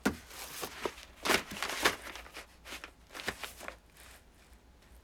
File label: Using Folder With Papers; Fairlight Sound Lib